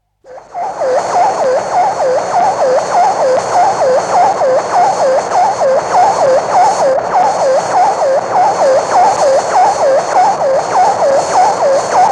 Vanguard 3 Satellite, telemetry effects from satellite; Vanguard 1 Satellite; Miscellaneous Electronic; BBC